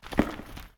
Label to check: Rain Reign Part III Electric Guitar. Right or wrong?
Wrong